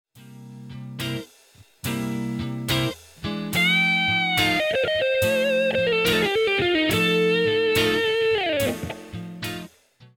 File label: blues maj min6; fretjam